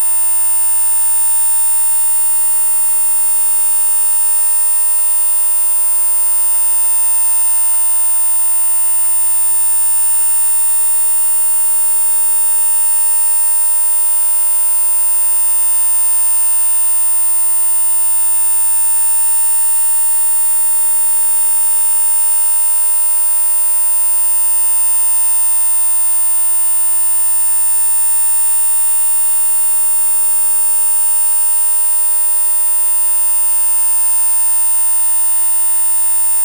signal amplified with tone 8000hz and through transmitter; audacity